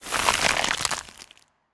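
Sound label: Ice Death swoosh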